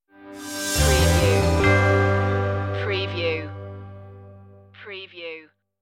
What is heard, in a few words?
stinger